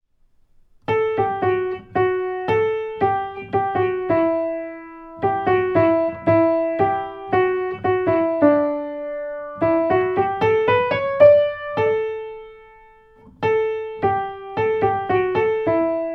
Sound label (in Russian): Ритмический диктант; 3 класс сольф